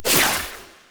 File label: Scythe Spin; Weapons; Stalker Jade Scythe; Warframe